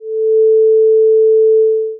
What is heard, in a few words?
Envelope